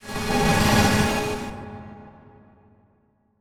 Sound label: Transition Metallic Swell; Production Element